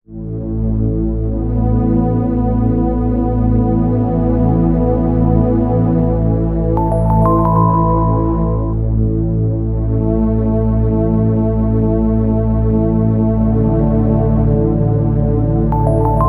ambient; X-Bow Software; 2004 - Star Wolves

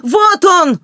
attack, human woman fight; Stalker Anomaly; characters voice

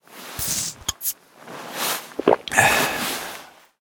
drink cola use; Stalker Anomaly; interface item usage

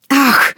hit, human woman fight; Stalker Anomaly

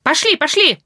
attack many, human woman fight attack; Stalker Anomaly

